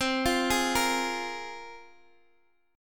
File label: C 7th Suspended 4th Sharp 5th, C, down, Guitar, Open G